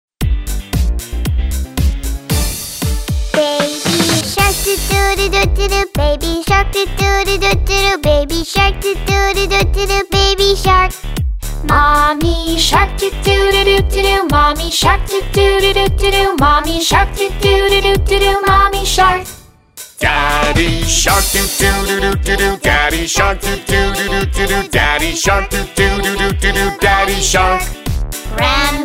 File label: vocal